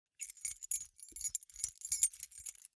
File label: object key key ring small rattle hard